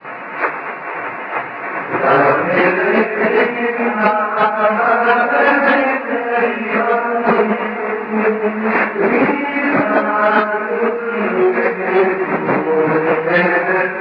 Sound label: 7520KHz; WEWN